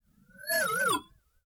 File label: electronic zing pass by swirly